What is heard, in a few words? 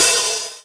Hihat Open; Drum Machines; Drum Hits